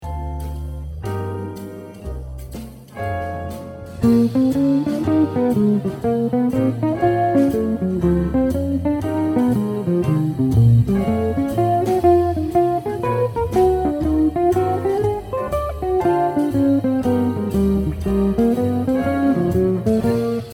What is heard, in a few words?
Lick